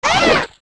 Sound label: Hit; Lizalfos; zelda; OOT